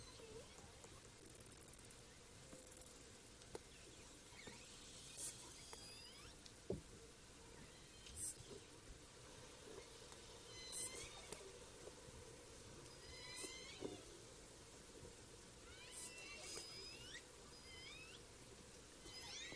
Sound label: SRKW; biophony; bouts; JL